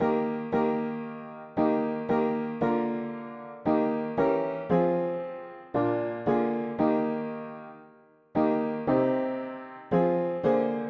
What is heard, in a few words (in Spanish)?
mono piano; hymns; Himnario pequeno 2Pte